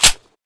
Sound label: bolt